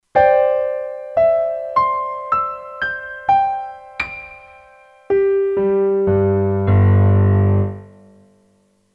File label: Default Setup, Kurzweil K2500X